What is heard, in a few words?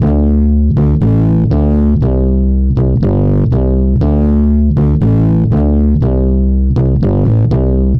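Bass Retro D major 120 bpm; Guitar and Bass; Ableton; Factory Packs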